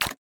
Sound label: ui Pop; b23 zuma